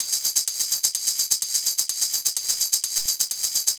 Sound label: hihat